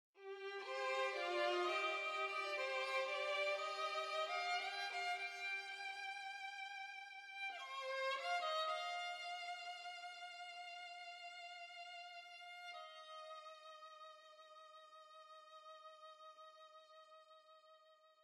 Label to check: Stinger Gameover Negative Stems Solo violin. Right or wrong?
Right